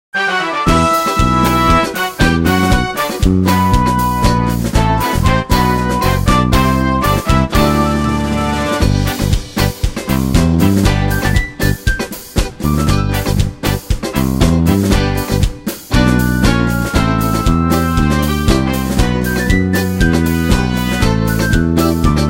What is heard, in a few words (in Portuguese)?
hino acailandia playback